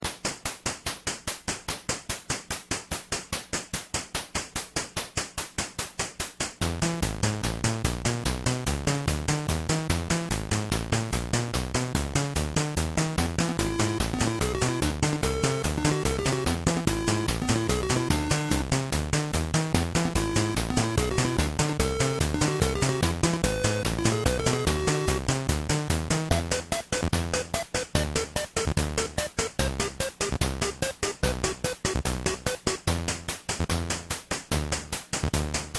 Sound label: Space Trading Loop; Spore